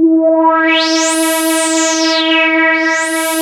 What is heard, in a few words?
ARP 2600 E4, synthesizer; Hollywood Edge - Giorgio Moroder Rare Synthesizer Collection